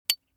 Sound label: object metal small impact medium high brillant